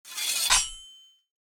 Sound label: sword storage